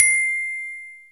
glockenspiel; SN-R8-07 Mallet

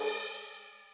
ride, Millenium MPS-400

